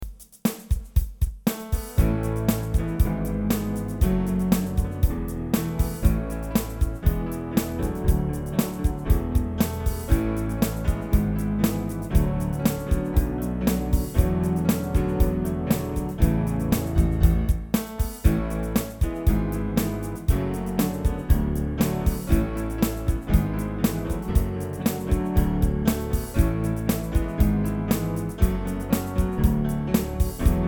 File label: Instrumental